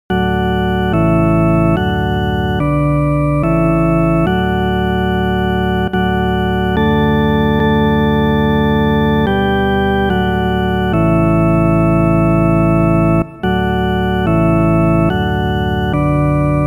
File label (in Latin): solo org Ave Marria, organo